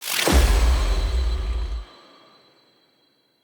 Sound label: Armour Plating Impact; Powers; Bromius